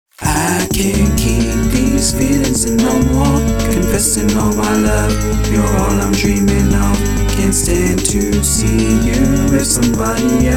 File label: Funky